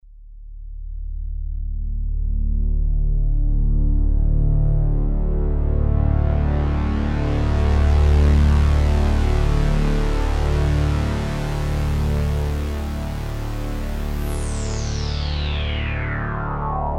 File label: Lowpassfilter; KingKorg